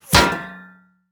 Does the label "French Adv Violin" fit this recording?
No